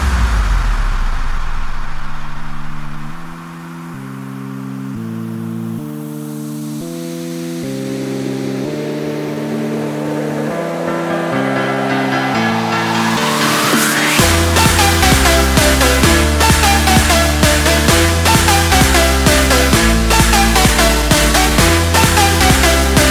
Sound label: Edm Tune